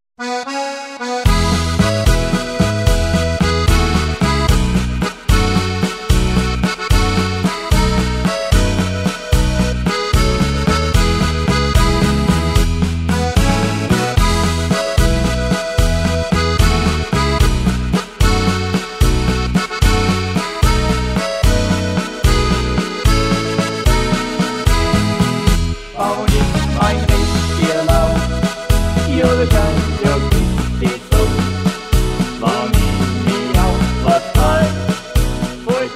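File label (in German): Playback Chor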